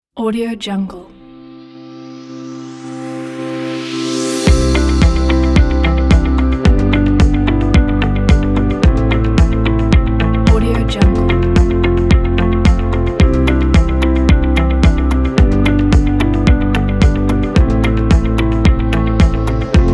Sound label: optimistic commercial